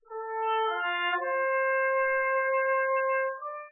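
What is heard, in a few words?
trumpet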